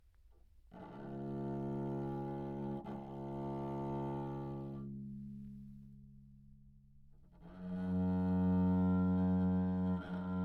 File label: open, bass viol back mic, Anechoic; OPENAIR